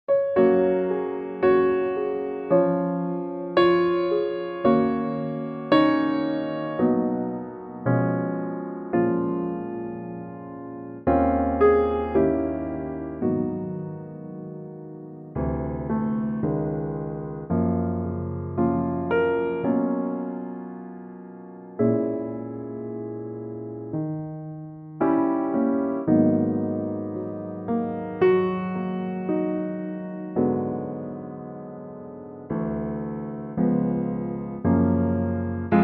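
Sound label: Gb Piano, male; key - Gb - vocal range - Gb to Ab; wonderful ballad piano arrangement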